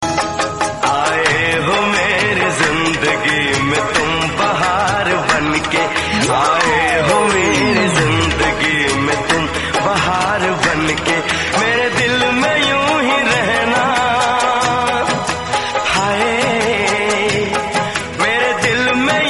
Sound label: ringtone male version